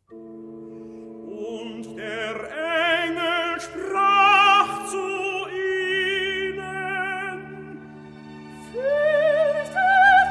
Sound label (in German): Rezitativ; bach; BWV248; Weihnachtsoratorium; Und der Engel sprach